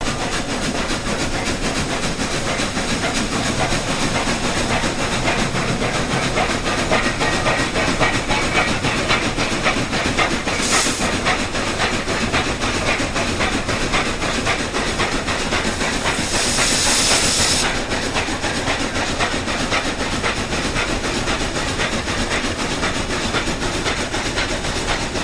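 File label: Steam Loco Medium Steady, steam train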